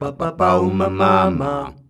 BABAUMAMA, MALES, Hyper Dance; Best Service

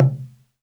Indian Percussion Tabla; Mango Loops

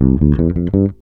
REAL BASS, BASSLICKS; Ueberschall; Jam Box

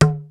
JD-800 KIT

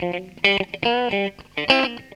GTR, Funky Elements GTR RIFFS; Zero-G

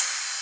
Rides, Cymbals

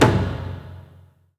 A#3 TIP HI, JAPANI PERC; Northstar; The Wizard VOL-1

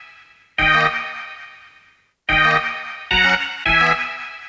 String Hits; Nuclear Sub

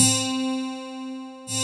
C4, SynthLine

